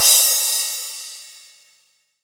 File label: EDM Future Crash, Cymbals; Big EDM; Future House Tools